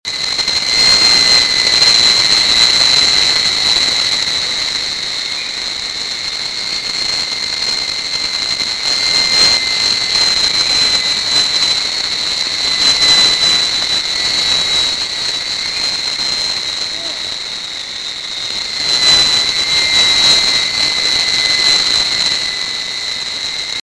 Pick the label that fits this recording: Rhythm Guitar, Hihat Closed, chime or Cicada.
Cicada